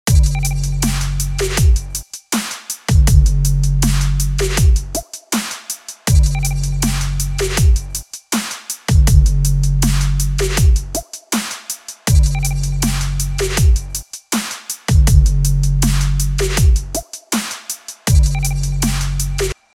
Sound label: Electric Kit; UBEAT